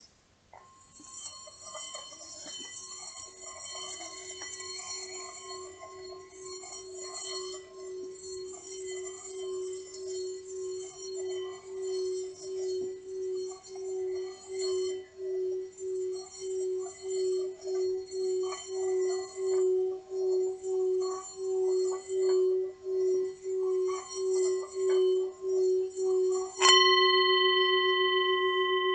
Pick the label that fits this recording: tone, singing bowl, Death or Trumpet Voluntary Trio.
singing bowl